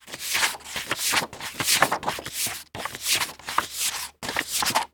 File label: flipping pages quickly